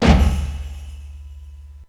BIG BOOM

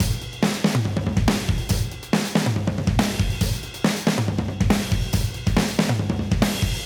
Modern Breaks; Touch Loops; ClassicBreakSessions

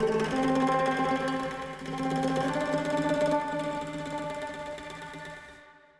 ethno, 160, C

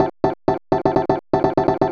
HOUSE EXTRA, 125

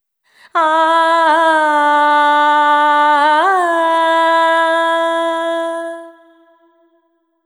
ETHNO FEMALE, Vocals; ethno2

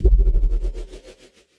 Perc, drums; Touch Loops; BlissedOutBeats

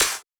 clap snare; Anthemic

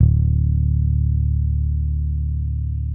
BASS D1, electric bass

